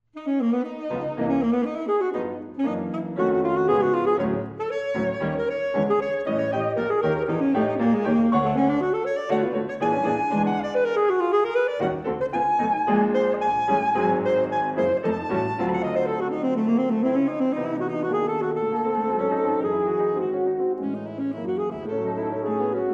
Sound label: saxophone; creston